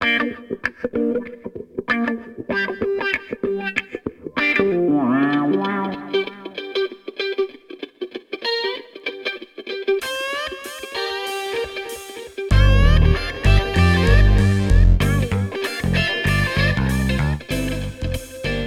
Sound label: Scratch Hop